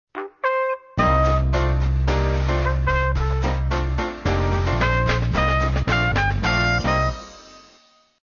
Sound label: jingle